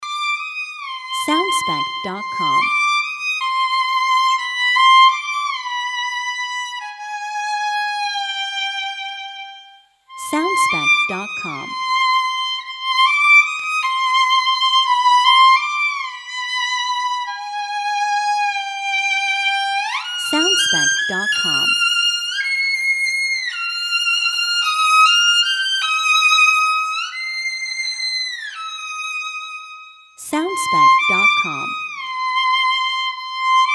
Solo Violin Pathetic

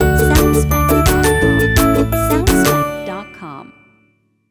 Happy UpBeat Logo Version

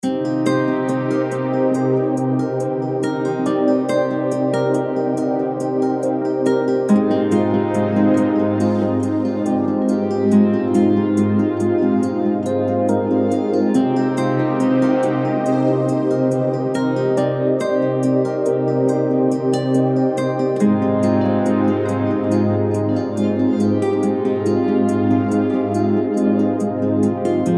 Sexy Lounge Lite Loop Version

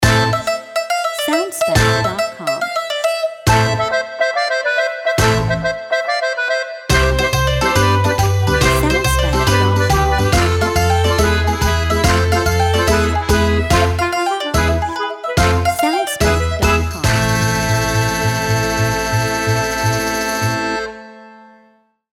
Tarantella Tavern Dance Short Version

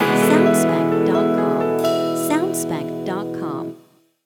Good Vibe Pop Signature

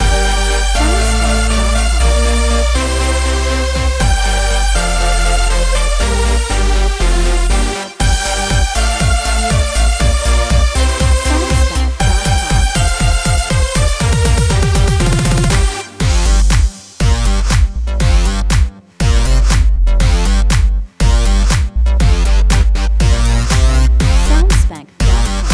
Dance in the House chorus verse line

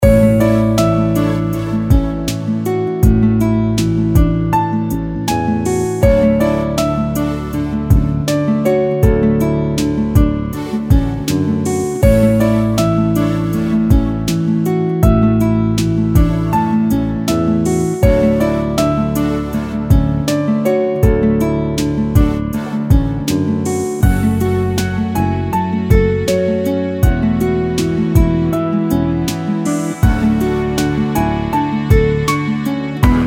Positively Neutral Short Theme Loop

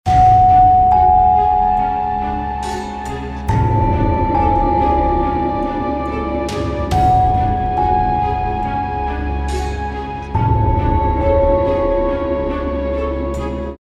Dark Temptation Loop

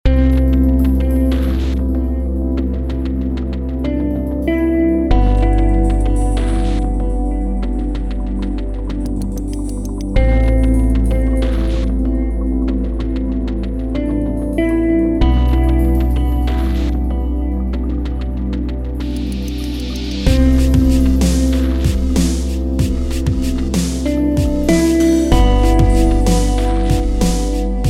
Lounge Loop

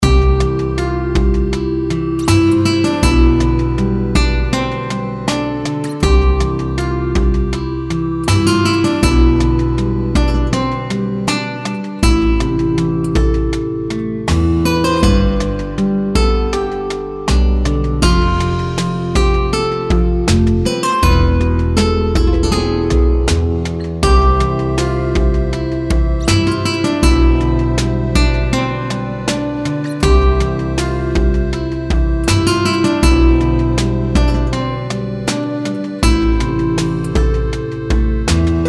Spanish Romance

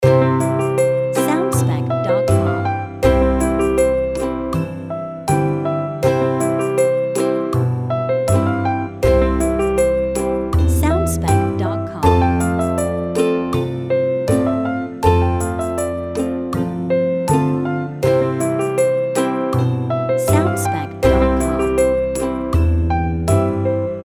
Love To Play short loop no perc FX

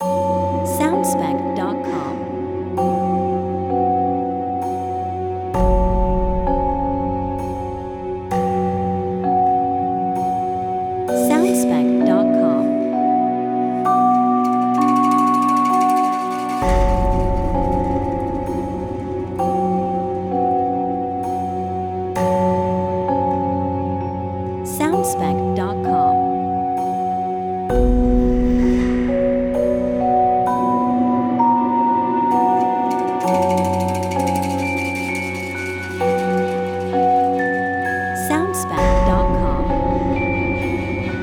Dark & Mysterious Temple

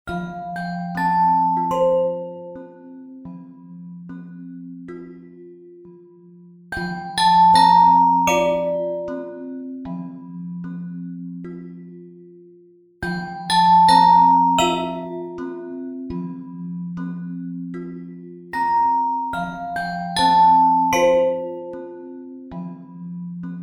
Broken Piano

